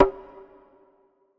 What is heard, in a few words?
Wood Dark, One Shots Drums